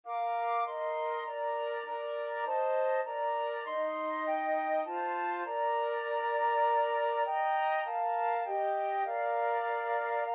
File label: flute trio